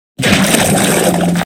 swallow sound; bfdi

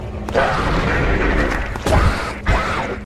scream; yarnaby

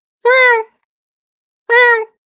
kitten meow; discord

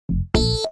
beeep

